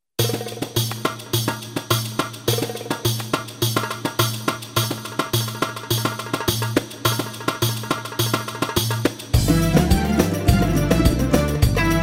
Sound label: psr a1000 tr